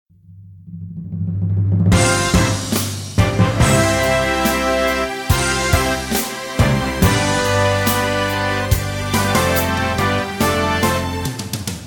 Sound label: (C To Db) No Backing Vocals